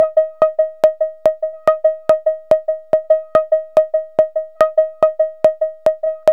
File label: VCS CLICK PULS; Zero-G Ambient 1; CLICK+PULSES